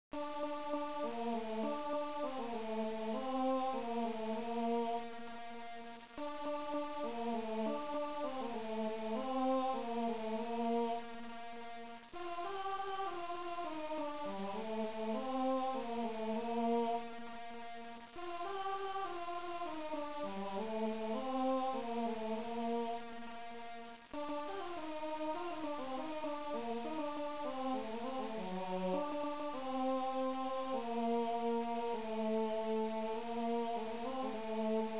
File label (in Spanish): a mi nio tierno tenor